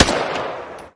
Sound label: Rifle; MilkChoco